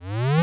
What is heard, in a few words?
square jump big crush; Square Waveform sfx